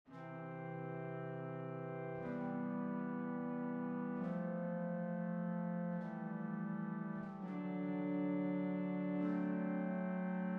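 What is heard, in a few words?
214 notes, Pianoteq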